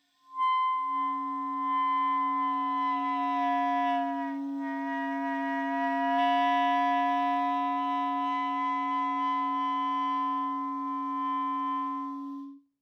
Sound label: multiphonic gliss